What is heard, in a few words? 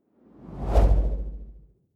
whoosh impact soft wobble bass